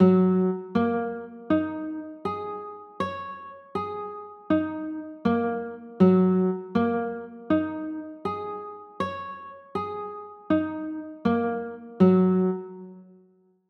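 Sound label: G flat 6 chord, guitar; g flat 6 9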